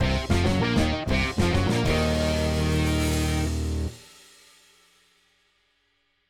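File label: Factory Boss Phase 2 Transition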